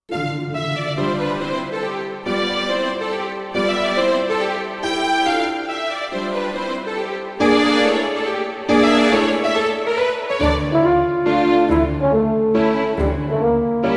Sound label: mazurka in Es plus